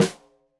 Snare Stereo, Drums; Deeply Sampled Drums; SMDrum

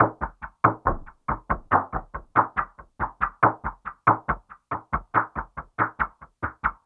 Under Pan, Percussion; Drums & Percussion; Electronic Point Blank

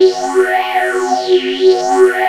3100 G 3, Korg; Back In Time Records; Korg Universe VOL-1

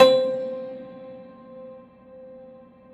Rubbery Piano, prepared piano; Prepared Pianos; Total Piano; Rubbery Piano 2 +ped

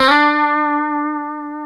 D 4, Guitars; Spectrasonics; Hans Zimmer Guitars VOL-1; 90's Sample CDs; COMP POP+VIB

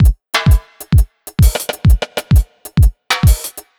130 jam, Ragga & Dub Reggae; USB Soundscan vol.27; AKAI

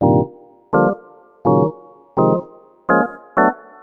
Organ chops (125BPM), retro house

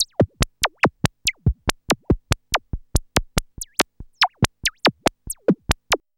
BEAT, RHYTHM; AMG - Vince Clarke Lucky Bastard

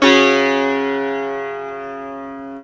SITAR CHORD; SITAR CHORDS

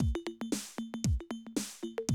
Classic Drum Loops

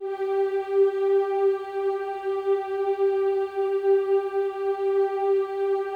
G4, CHOIR; Spectrasonics; Symphony of Voices

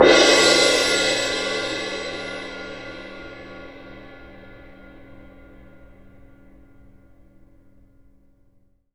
CYM MF, Percussion; ILIO; Synclavier Percussion 2; World & Orchestral

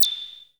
Korg Z1

